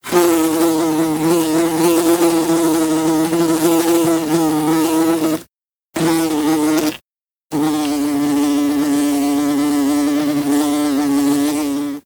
Random Fly; moul-assets

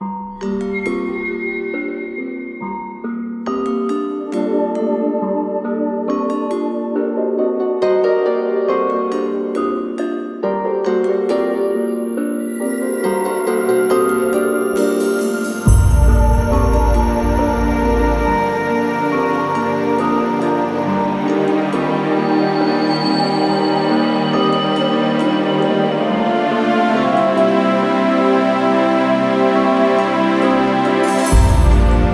Intro Music; Yeesha

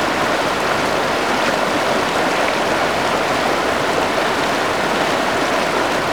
Waterfall Loop

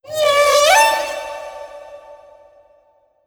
Critter Male Verb; Kahlo

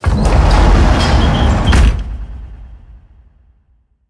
Cave Door Close

